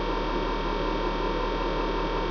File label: AMB